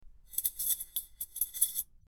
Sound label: Keys jingle